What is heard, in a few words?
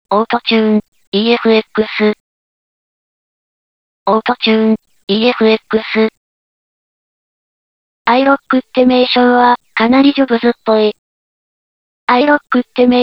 auto tune mono; auto tune efx demo